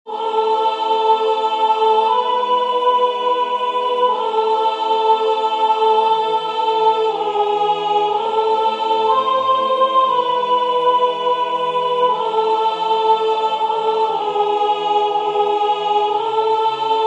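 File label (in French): soprane, Musique sacrée; Jesu dulcis memoria; Victoria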